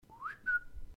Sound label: Cuckoo Whistle